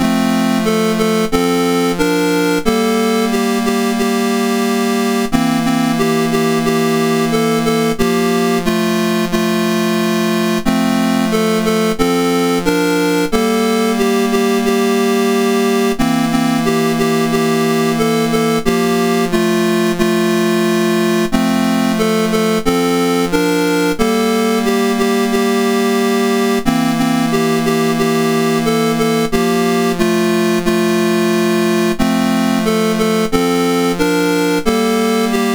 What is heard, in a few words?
Mocking Bird, MOS6581R2